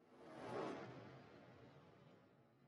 swooosh

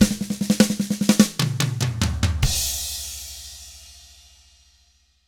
SHUFFLE, Drums; ILIO; Double Platinum Drums 1

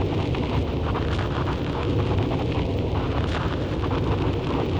Cracklature, sparse soundscape

